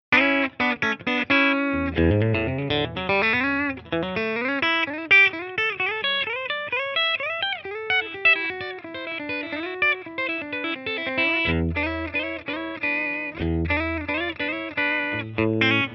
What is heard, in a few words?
Strat country